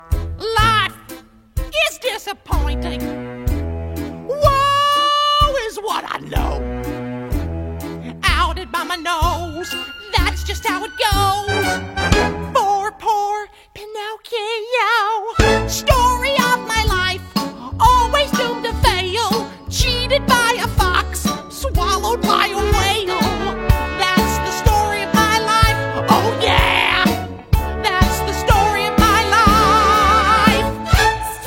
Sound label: Vocals; Pinocchio; academy players audition materials